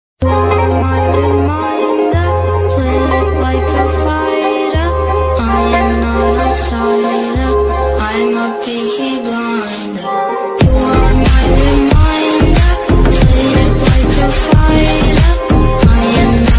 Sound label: sigma ringtone attitude ringtone